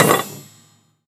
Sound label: Metallic bang; Foley; SFX; 7rystan's 600+ FREE EDM SAMPLES & DRUMS